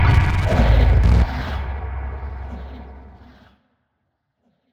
take damage; Watcher

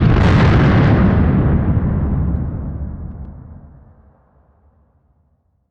Explosion Large, Barotrauma Weapons

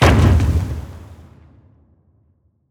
harpoon Launch; Thalamus; CARRIER

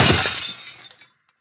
Glass Impact; Damage

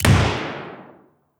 Revolver; Weapons

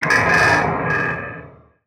attack; Barotrauma; Legacyfractalguardian2

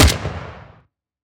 WEAPON rifle Shot; Weapons

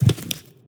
Footstep Crawler, Monsters; Footsteps, Monsters

